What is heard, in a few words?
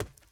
Sprint; SandBox Musics